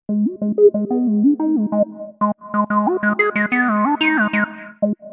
gentle tb303 melody, synth and electronic loops

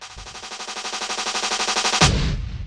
179 roll boom on four, drum loops and breaks; 161 to 180 bpm

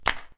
real verb real; clap; Claps

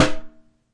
Dustbin, Found Percussion Hits; UNUSUAL KITS and FX; ModeAudio

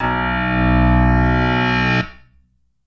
Moo Piano B1, backwards piano

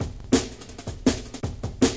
167 mash, drum loop; 161 to 180 bpm

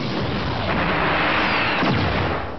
Cannon

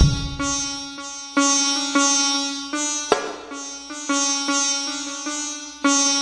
sitar kick snare; WORLD LOOPS

